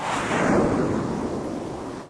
Missile Launch

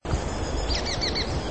Nuthatch Bird Call

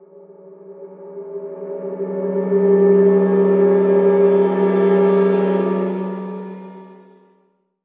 Eerie Swell

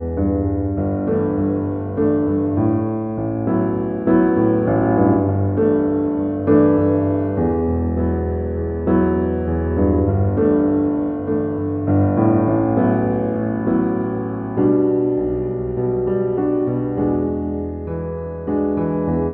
Pop Piano 100

